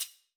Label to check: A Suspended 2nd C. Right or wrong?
Wrong